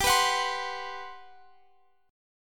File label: G Major 11th, Guitar Open F; Listen to GM11 strummed; GM11 Chord